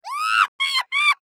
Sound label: pet monkey; mir2; crystal